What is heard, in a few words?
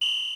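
Samba Whistle High, Yamaha DD-35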